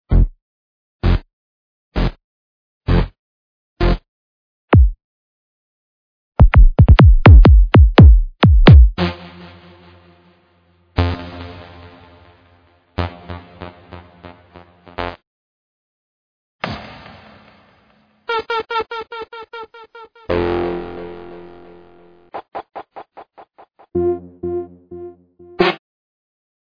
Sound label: mega trance; Trance music production; G-Stomper